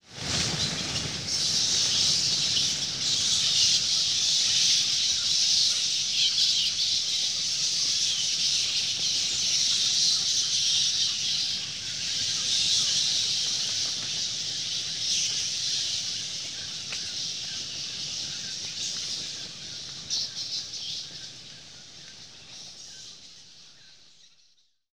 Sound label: Bat Swarm, Vocals Monster; Sony Pictures Sound Effects Series